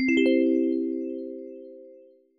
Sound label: glass bell; Ultimate GUI Kit